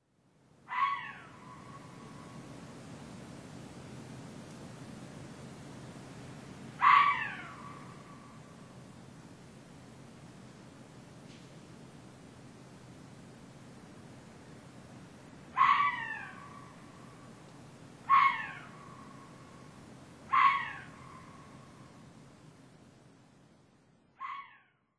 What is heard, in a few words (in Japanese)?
kitakitsune; koe; muroran